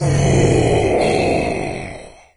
death; doom3; commando

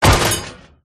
gunstand drop; gow; turret judgement

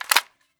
ak47 clip out

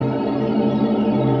magic deffence

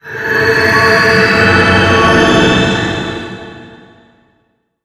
miracle heal

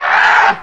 leap, fast zombie

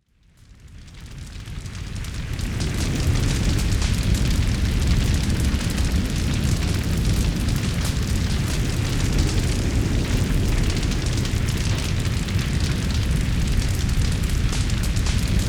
fire smoke, heli; wac

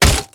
step run

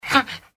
gecko panting; npc

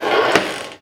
plunger; snds jack gmod

